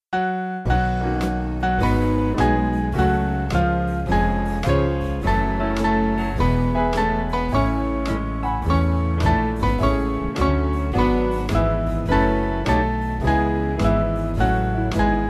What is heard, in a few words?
Band; To Thee O Lord